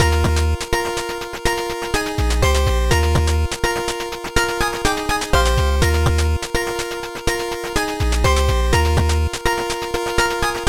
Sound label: 8Bit loop; PMD2; Drenched Bluff